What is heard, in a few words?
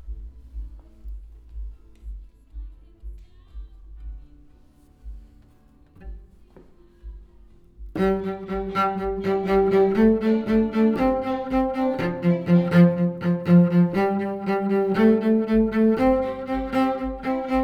Cello